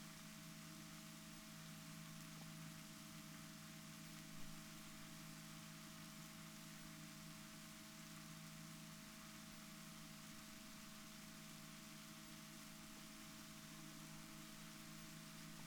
rorquals negatif, Balaenoptera Physalus Chile

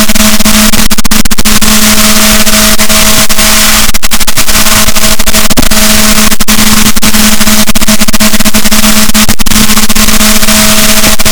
rorquals, Balaenoptera Physalus, boat

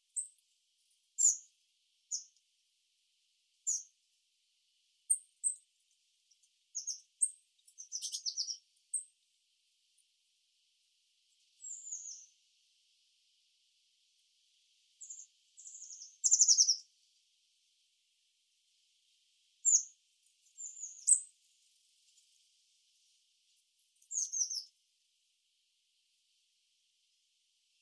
Cyanistes caeruleus; SETE

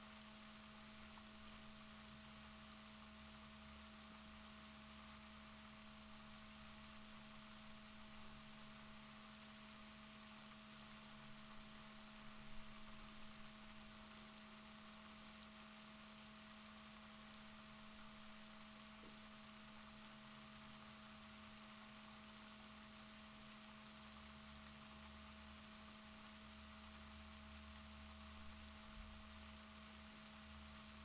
rorquals positif, Balaenoptera Physalus Chile

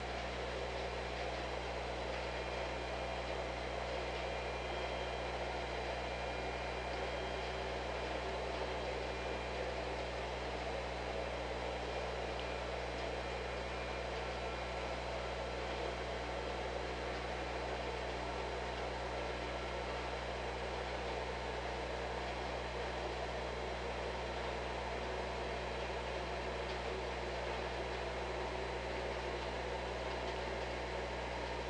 OrcaLab

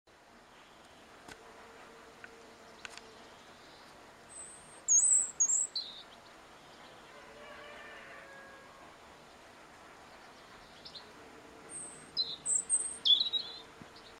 Erithacus rubecula; SETE

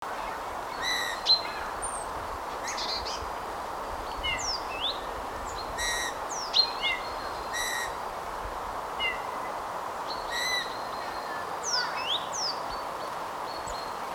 Pyrrhula pyrrhula; SETE

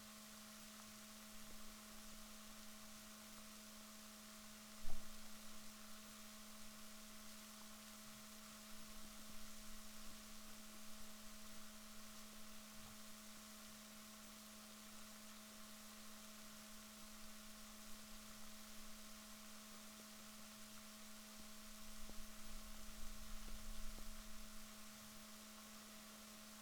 rorquals negatif, Balaenoptera Physalus Chile

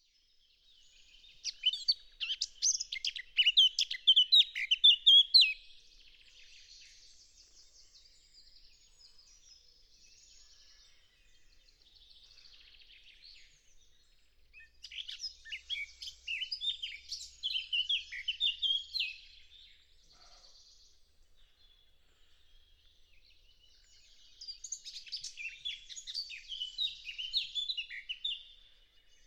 Sylvia atricapilla; SETE

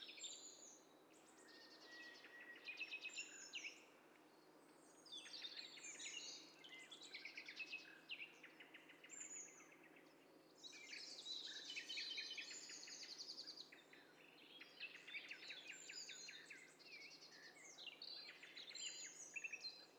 STEREO BIRD COCKTAIL PARTY

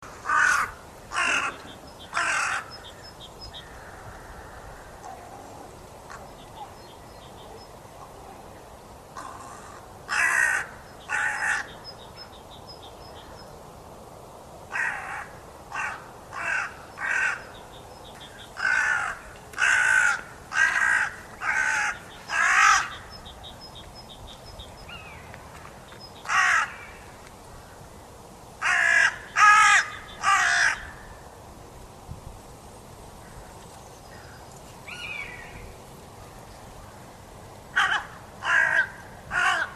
Corvus corone; SETE

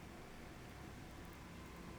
pulses, BALAENOPTERA; MONACO 2022-07

